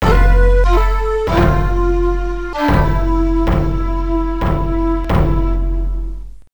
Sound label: Victory